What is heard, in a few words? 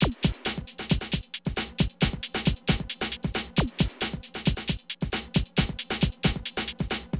Drum loops, Rave & Hardcore